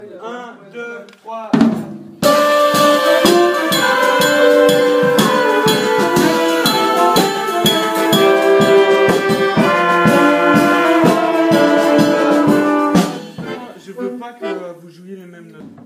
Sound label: Cool reggae